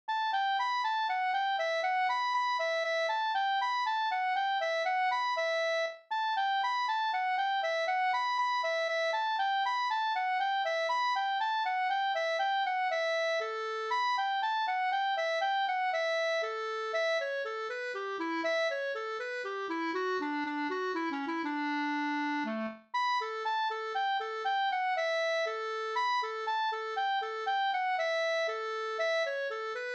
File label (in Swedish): polska springdans; fr Hallingdal